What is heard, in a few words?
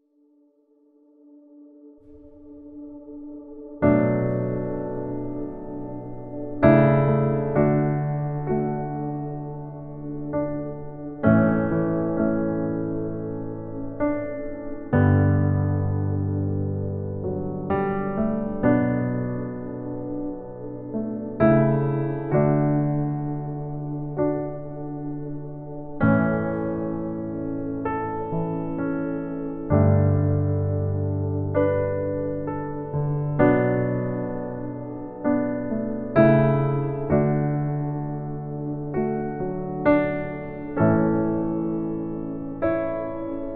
karaoke piano